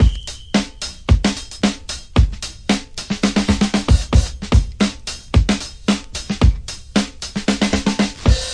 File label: classic break, DRUM LOOPS and BREAKS